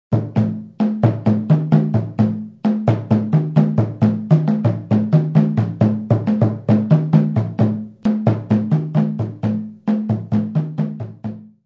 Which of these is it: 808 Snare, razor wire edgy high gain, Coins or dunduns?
dunduns